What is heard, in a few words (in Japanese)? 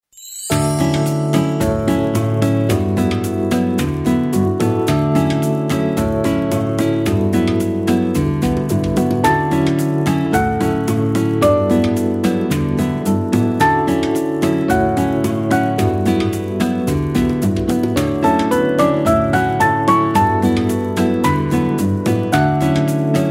ゆったり ピアノ ギター 口笛